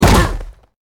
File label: hit; csgo